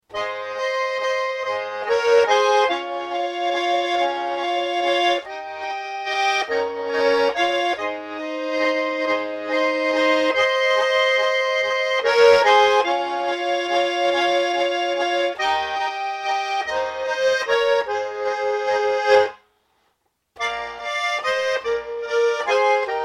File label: sunny summer waltz